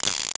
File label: colt creak